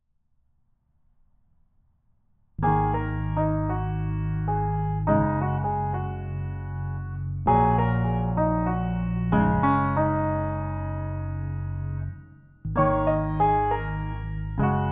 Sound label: piano; I’m using a felt over the strings of the piano to give it a softer sound